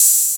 ELE OH, Drums Dance & Groove; USB Soundscan vol.11; AKAI; ELEC. KIT

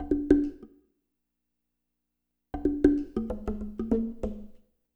AF SHUFFL SLITS, Liquid Grooves; Spectrasonics

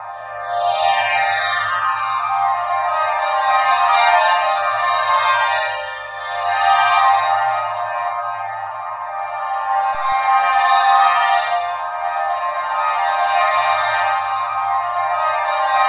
TRANSOLIA; Spectrasonic Distorted Reality 1; GLISSOLIA

